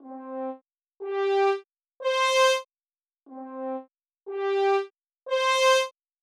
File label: horns